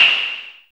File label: JUNO NOIZ HI, JUNO PERC; Drums In Your FACE!